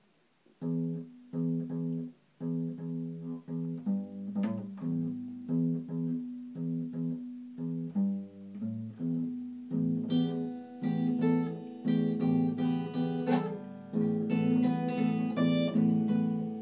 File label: blues riff